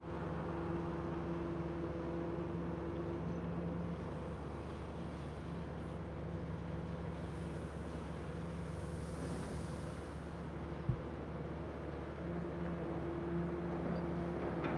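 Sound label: port exterior bulk offload engine gravel clatter heavy; sonniss gdc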